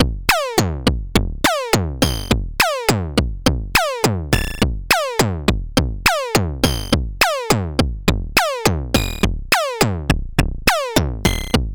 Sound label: Arcade Release Percussion; Reon RE501 RX Driftbox